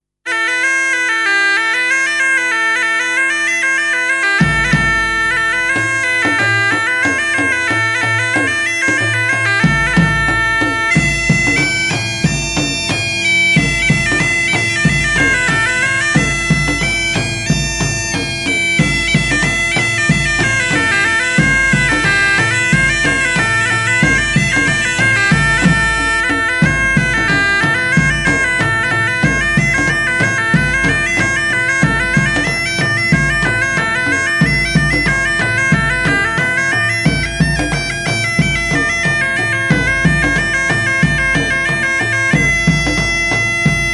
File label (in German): Geiertanz